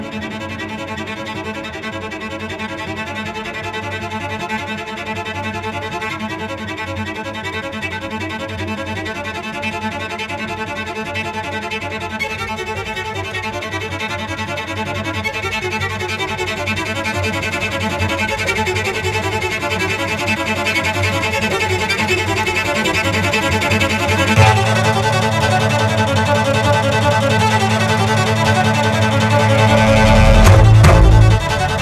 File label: cellos